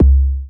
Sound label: kick, drums single hits; iElectribe Kicks